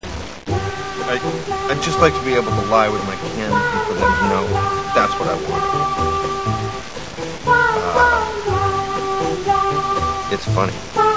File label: wahwah